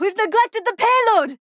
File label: Push Stuck Attack; Flak; Paladins